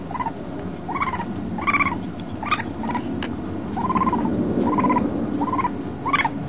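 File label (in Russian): пискливое стрекотание енота-полоскуна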